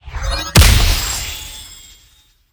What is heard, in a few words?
spirit punch; battle